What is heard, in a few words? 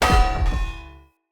Bird Repulsor Destroy Chunk Surface Impact; Objects; Thawtide